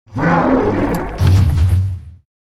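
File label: beast death, monster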